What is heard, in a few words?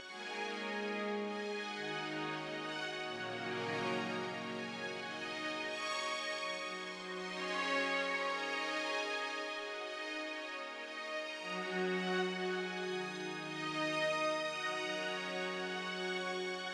Spirit Pad Drone; Proteus